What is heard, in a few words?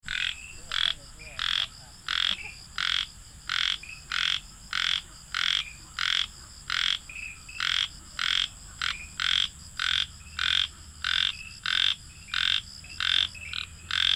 ornate treefrog; dalin